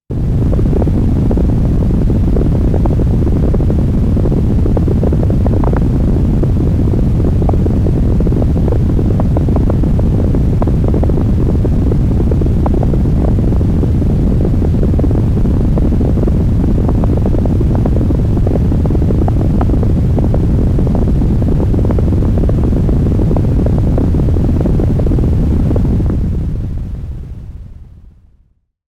MS-20 Unterwasser Geysir FX; MS-20 Patches

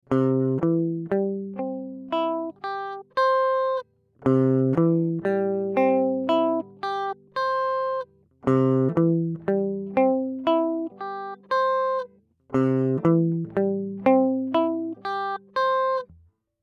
Gitarre Arpeggio

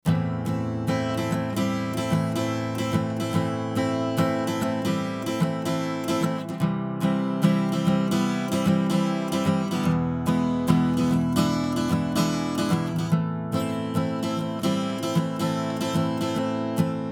Leveler Gitarre Parallel 4 zu 1; Fredenstein Artistic

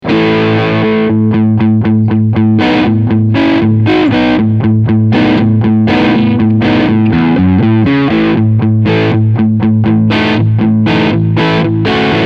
Beide PUs Crunchsound

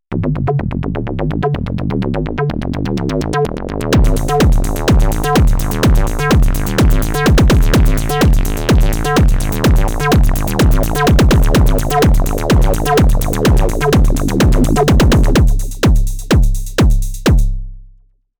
blubbernder acid techno; syntakt workshop

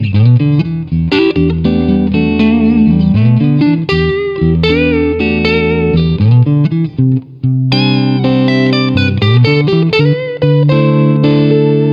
Halspickup Duett

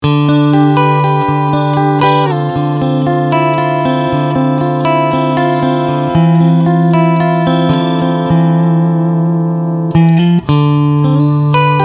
Mit Chorus

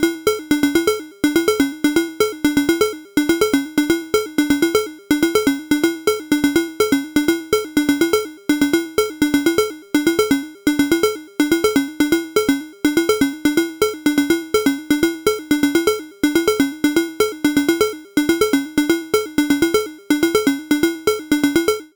Bastl Instruments Popcorn im Taktraster Aenderung Sequenz

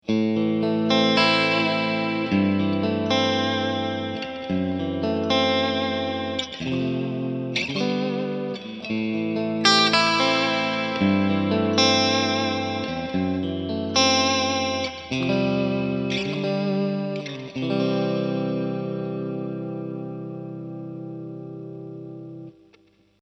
100 wet Steg

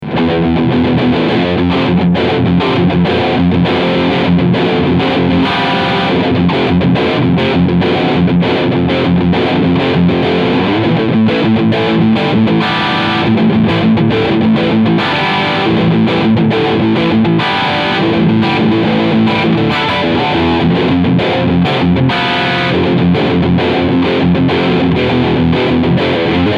Overdrive Steg PU

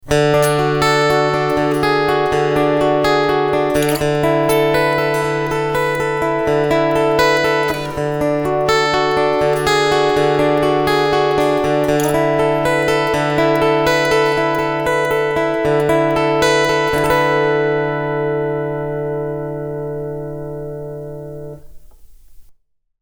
Bass Mid Boost ohne CoolTube

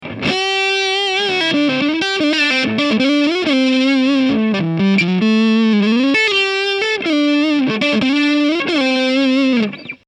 Hals Zerre

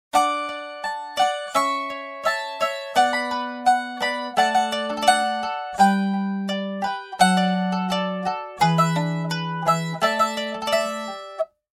Picking Mandoline

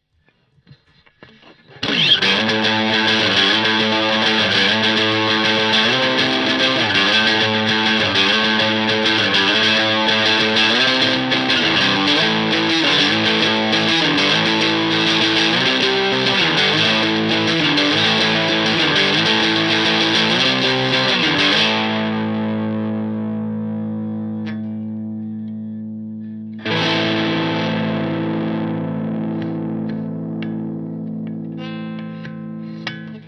Travel Guitars Zerre Piezo

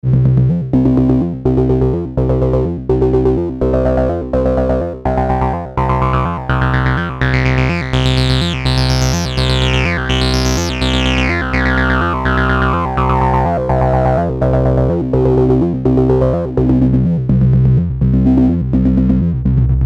Polivoks pro sequenz

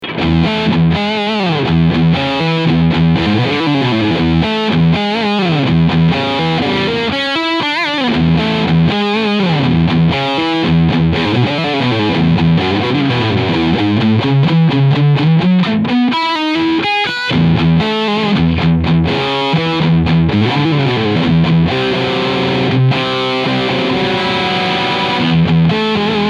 Chapman Guitars ML3 Pro Modern Semi Hollow Riff Steg Pickup

